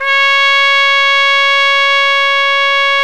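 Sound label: BRA TRUMPE, trumpet, brass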